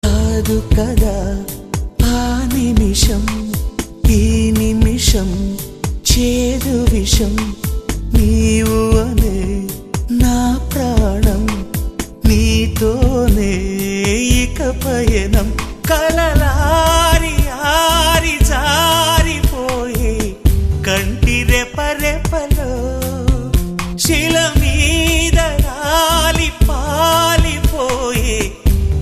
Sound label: Sad Song